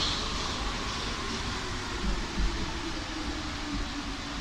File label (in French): freinage, Metro